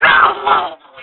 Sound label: Drown; Link drowning in water